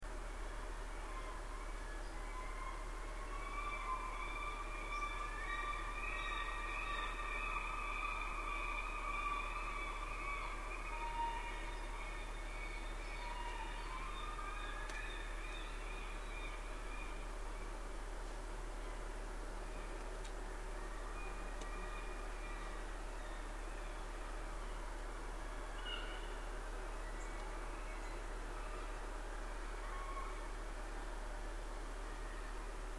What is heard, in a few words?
gulls